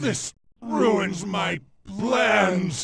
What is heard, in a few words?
death; vegetto